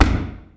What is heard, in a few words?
crash; earth-to-echo